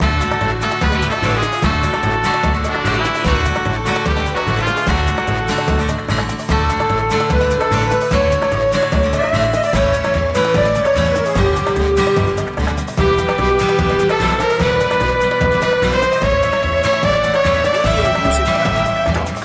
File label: circus dance loop